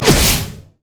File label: q attack; LoL; jinx base